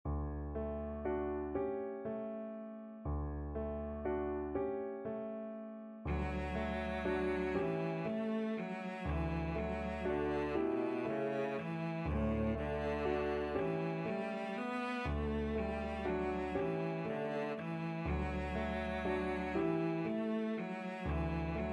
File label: berceuse, cello